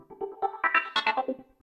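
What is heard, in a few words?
synth electro 140 A; Techno; Keys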